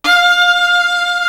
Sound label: SOLO VIOLIN; East West; Global Sounds